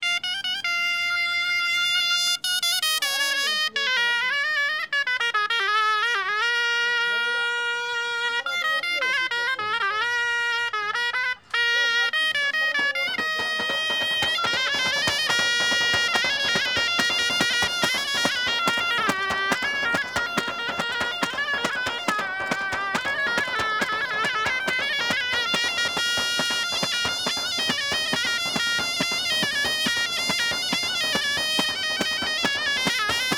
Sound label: Flute traditionnel rita with tambourine, Morocco; Charlie Atanasyan; Faunethic